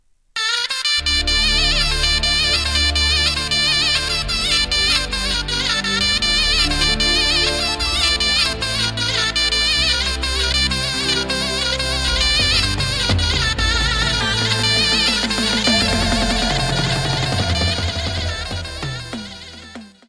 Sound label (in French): Halay, Turquie